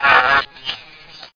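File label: worker die, bugs; Starship Troopers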